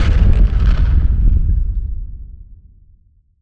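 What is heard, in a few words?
medium explosion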